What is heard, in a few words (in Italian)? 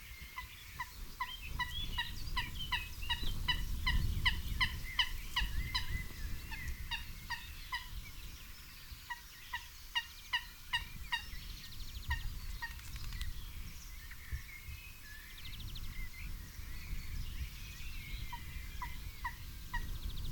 Gallinella d'acqua Gallinula chloropus; FEDERICO Lionel